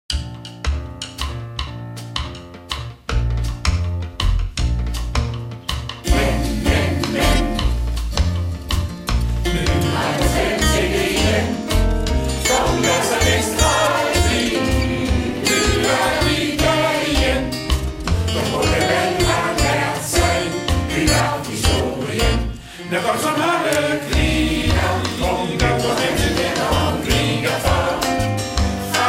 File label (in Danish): glad Calypso